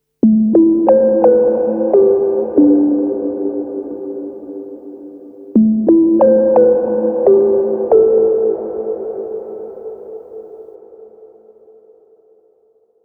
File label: Resonance Noise Pluck reverb